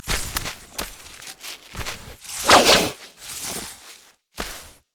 emote dance; khazix

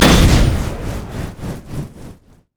thermal charge; noxian; LoL; urgot

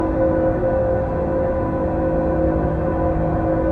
standard loop; demacia; LoL; jarvaniv

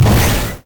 rocket jump; rocket tristana

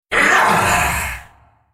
Droid spellcast effort

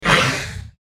wraith dying; Character Voices; English

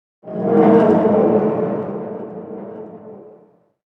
big chains groan

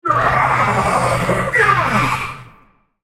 dying; Xerath; Character Voices - English; en US

